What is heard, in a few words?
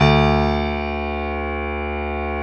Piano D1; Proteus 2000; Digital Sound Factory Proteus 2000